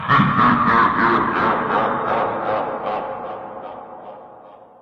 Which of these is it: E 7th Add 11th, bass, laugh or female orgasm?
laugh